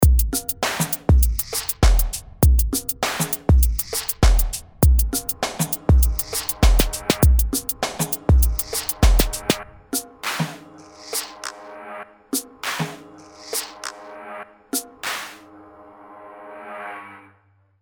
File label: Hip Hop; RP Punch